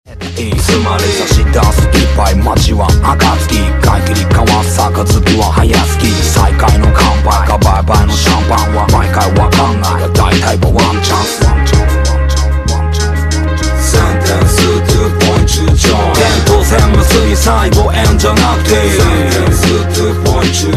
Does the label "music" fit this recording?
Yes